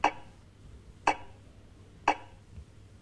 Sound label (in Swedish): trafikljus off